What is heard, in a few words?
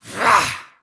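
voice charge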